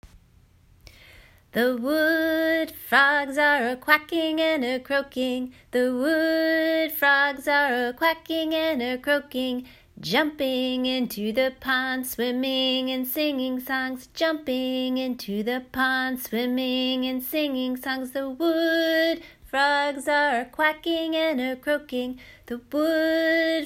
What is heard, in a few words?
Wood Frog Song